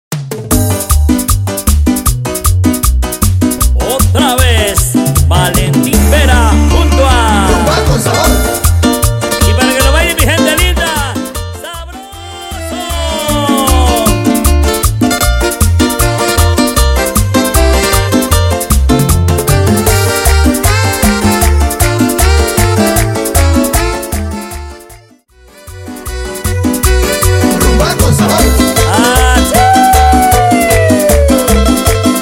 Rumba Intro Simple 155 Bpm

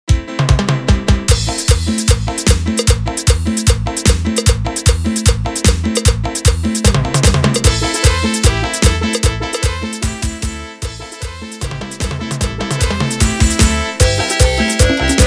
Instrumental; BPM 151

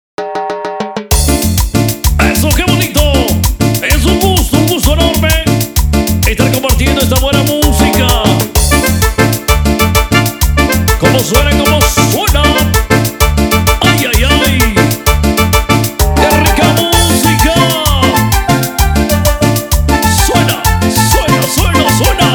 Instrumental Chichero, BPM 129

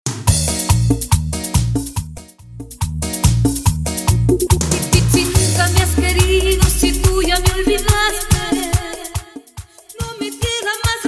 Intro, Outro, Acapella, 141 Bpm

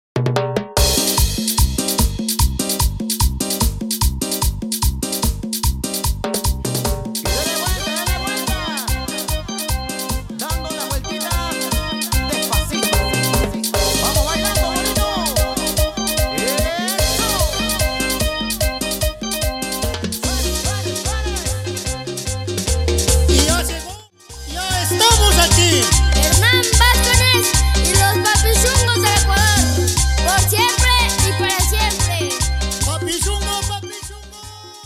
Mosaico, Intro Meladia 148 BPM

